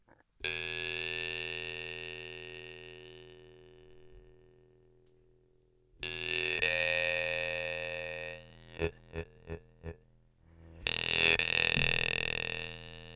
guimbardes; leader 120 mi2

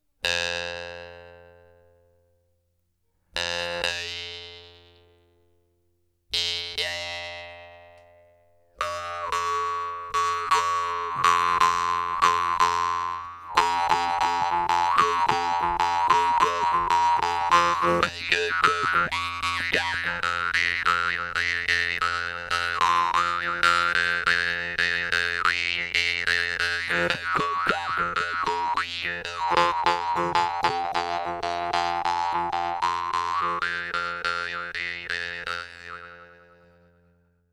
diomine noire f3, guimbardes

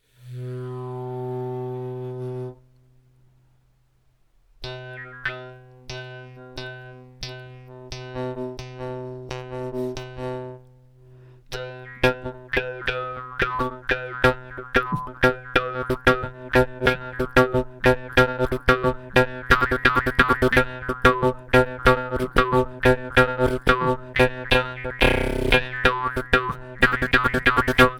morsing grave en si, guimbardes

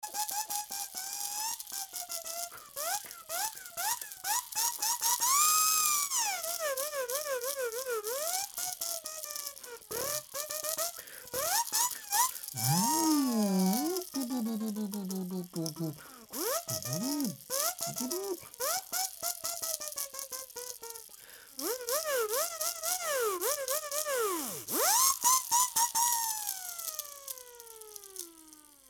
WIIIZZZZZ, Il suffit de souffler dans la petite ouverture

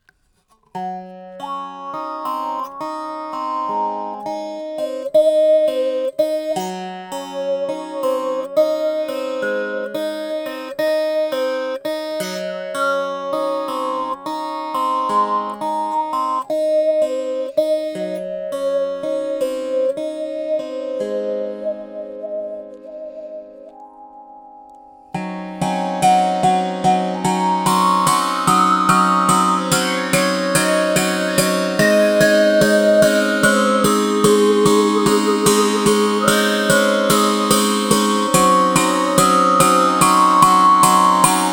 cosmicbow 5 cordes avec cuillere harmonique

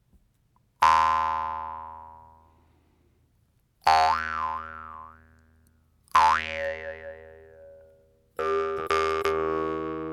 wood, guimbardes

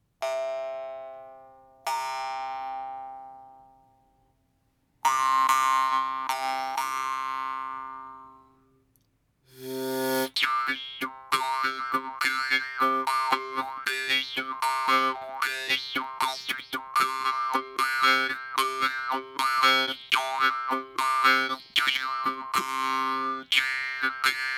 KHETA moyenne, guimbardes

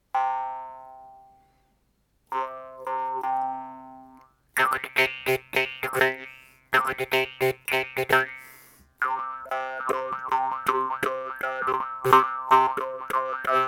morsing, guimbardes

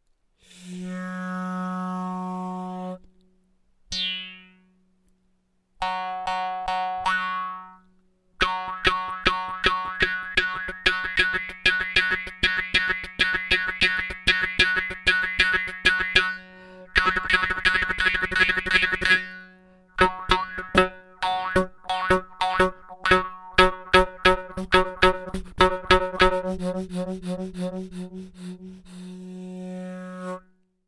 morsing aigus fa

